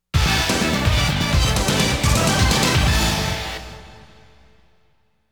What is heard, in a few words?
Pattern Clear BGM; konami all stars 1993; dreams 1992